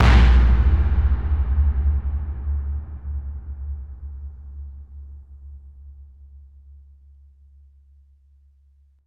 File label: Birch Cannon Stereo